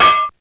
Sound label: METAL, ELEC PRCS; East West; Global Sounds